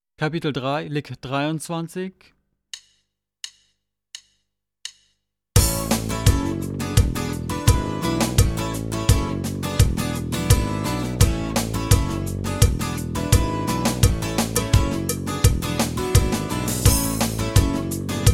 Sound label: Latin Style Guitar